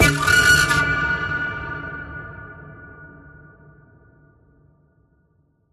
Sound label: Time Distortion